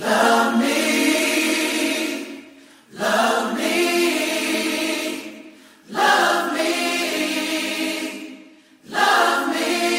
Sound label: love me song; grok